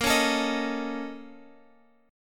A sharp Minor Major 7th Double Flat 5th, down, Guitar, Open F; strummed